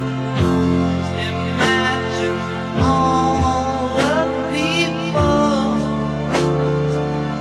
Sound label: Pop